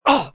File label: hit; druid